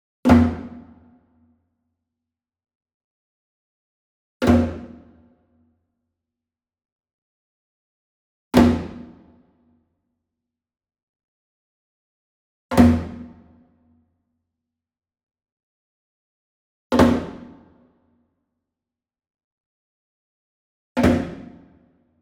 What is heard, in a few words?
Wood Percussion Wood Drum Floor Flam Slap Medium; boom library; Cinematic Strikes Construction Kit